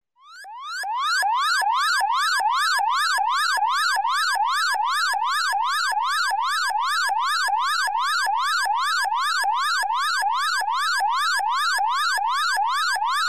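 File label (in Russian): сирена сигнал тревоги искусственный звук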